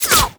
Flak Cannon Alt Fire Pass By

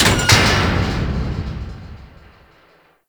Grate Open; Warframe; Grineer Ocean

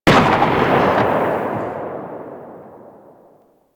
Tail, Weapons; Lato

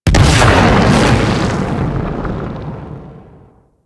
Grenade Launcher Explosion; Warframe; Weapons; Corpus

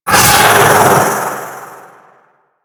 Vocal Attack; Warframe; The New War; Archon; Nira

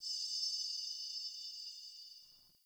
Under City Alley Distant Chains

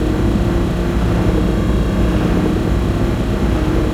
Machine Loop; ambience; Warframe; Grineer Galleon

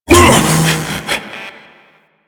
Ape Prelate Pain C; Enemies; Warframe; Proto Infested

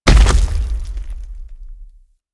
Infested Femur Step Default; Warframe

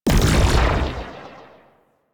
Fan Energy Trench Projectile Burst; Warframe; Warframes; Protea

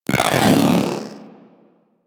Vocal Attack Melee; Warframe; Pets; Zanuka; Zanuka Companion A

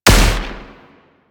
Shot; DE; Warframe; Weapons; Archwing; Arch Anti Aircraft Gun; Kuva Grattler

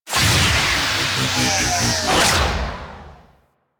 Crp Ship Spinning Reactor Stop; Ambience; Props; Warframe; Corpus Ship Remaster

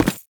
Step Default, Foley, Footsteps

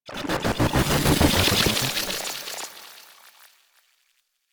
Hub Cephalon Wall Assemble; Warframe; Ambience; Tenno; Syndicate Cephalon Suda

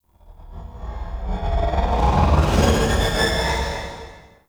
exterior passby; Warframe; Corpus Railjack; Ambience

